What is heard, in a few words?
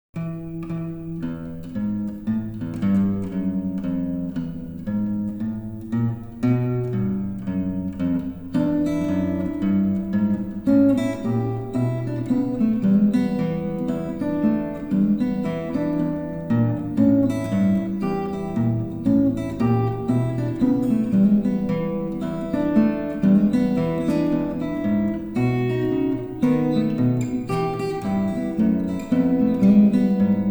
blues